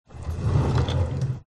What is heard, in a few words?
open drawer